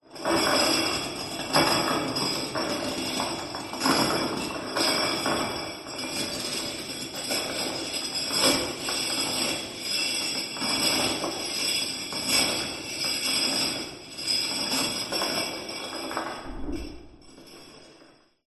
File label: horror chain large drag ground dungeon reverberant